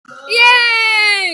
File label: YAAY